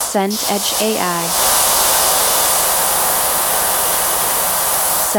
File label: shower running tiles